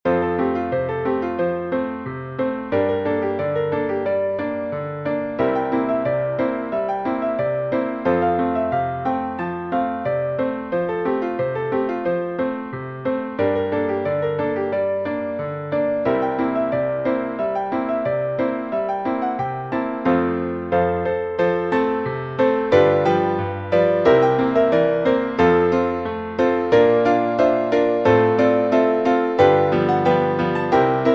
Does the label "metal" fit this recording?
No